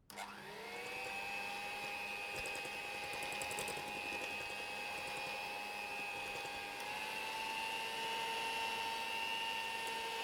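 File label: kuhonnyi mikser, kitchen mixer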